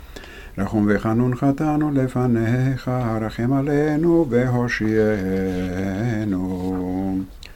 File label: Rahum vehanun rahem alenu vehoshienu; Selihot